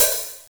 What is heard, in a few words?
1990s Crispy Open Hi Hat Sound D# Key, Royality free open hi hat sample tuned to the D